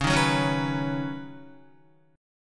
Db 7th Suspended 2nd Suspended 4th Db Guitar; JGuitar

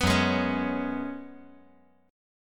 F sharp Suspended 2nd Suspended 4th; strummed; 4,6,4,4; down; 5G,4C,5E,5A

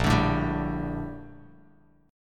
Bb Major 13th Bb Bass Standard; strummed; JGuitar

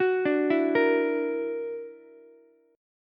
Gb Augmented 7th Gb down Ukulele; Gb+7 arpeggiated

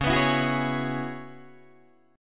C sharp Minor 11th C sharp, Guitar DADGAD

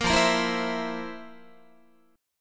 F Major 7th Suspended 4th F, Guitar; strummed

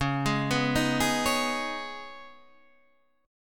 C sharp 7th Suspended 2nd

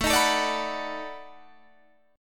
B 7th Sharp 9th, guitar

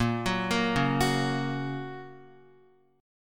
Bb Minor Sharp 5th Bb down Guitar; arpeggiated; JGuitar

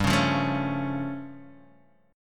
A Augmented 7th G

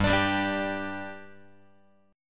G 5th G, Guitar; G5 strummed

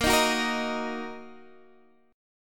Bb Suspended 4th Sharp 5th down, Bbsus4#5 chord; Bbsus4#5 strummed; JGuitar

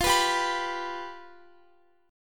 F Suspended 2nd Flat 5th, Guitar; Fsus2b5; strummed

